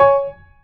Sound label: short C#6 G#6 C#7